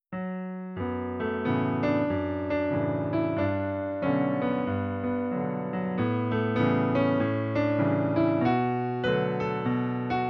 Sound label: 7th chords